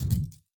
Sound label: Hand Paddle Attack Light